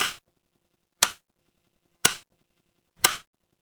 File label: mechanical switch